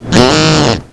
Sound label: duck call